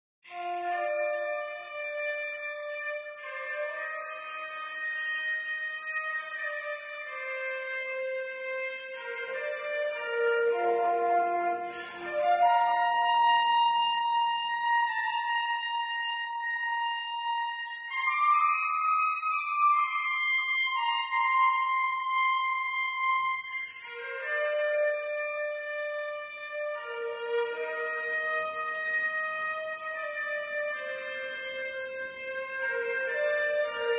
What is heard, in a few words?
Flute; Instruments; Zomboid; Lifestyle